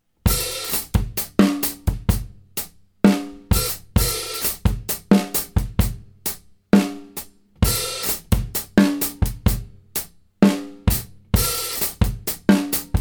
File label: Drum Loop, 65bpm, slow punchy